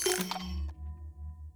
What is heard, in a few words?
folk